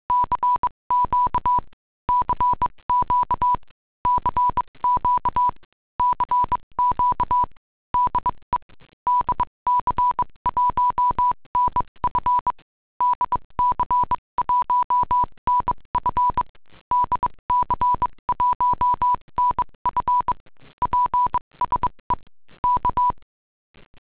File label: CW 20 WPM; Digitale Klangbilder nach Dieter Flasch DC1NF